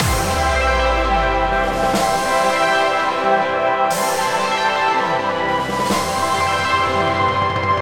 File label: BGM Scene Result page win; Toy Attack; Animoca Brands; Android